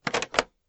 nuke press; SuperPower 2 Redux V7A

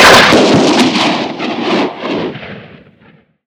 missile fire; SuperPower 2 Redux V7A; unit

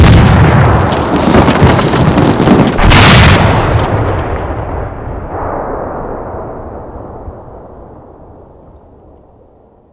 bigship hit; SuperPower 2 Redux V7A; unit

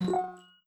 mail; SuperPower 2 Redux V7A; ui

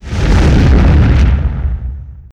nuke; SuperPower 2 Redux V7A

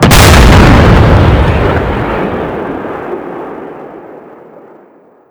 tank fire; SuperPower 2 Redux V7A; unit

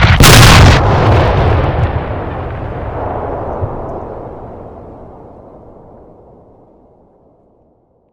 explosion big; SuperPower 2 Redux V7A; unit

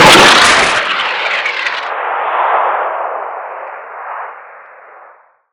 missile fire; SuperPower 2 Redux V7A; unit

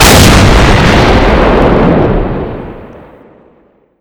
tank fire; SuperPower 2 Redux V7A; unit

